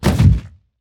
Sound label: Climb; Grounder; Evolve; Kraken